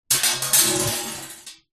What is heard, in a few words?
metal basket small drop concrete; zapsplat